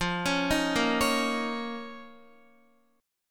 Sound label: F 7th Suspended 4th Sharp 5th F down Guitar; arpeggiated